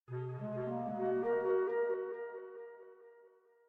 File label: Arp; Proteus